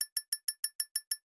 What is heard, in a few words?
multiple click